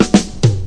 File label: reggae break, DRUM LOOPS and BREAKS